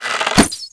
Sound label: Arrow Fire; Weapon